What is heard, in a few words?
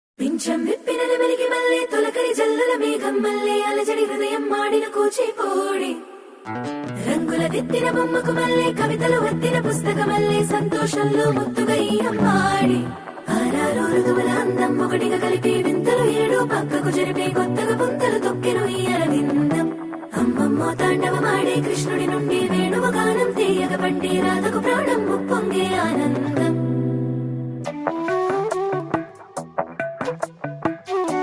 bgm music love song; the family star; murnal thakur; tamil